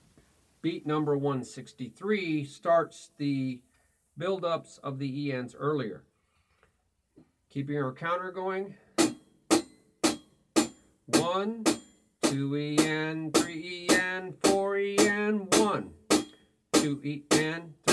Beat 1 2e 3e 4e Toom Ti ChaBaToom TiBaToom ChaBaToom